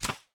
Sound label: atk arrow